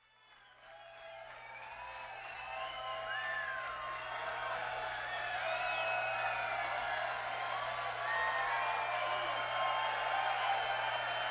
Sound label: Crowd